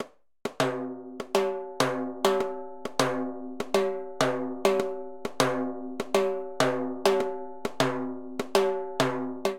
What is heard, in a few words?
Plena Timbales 100bpm; Latin Percussion; Ableton; Factory Packs